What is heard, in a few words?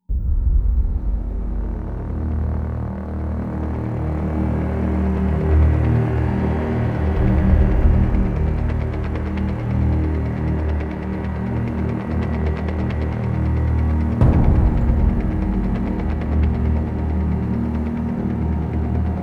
Stealth Tension; MP Suspense; Oneshot